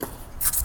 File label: hoop drummer scribble strap; hoops drums; drum kit; nova sound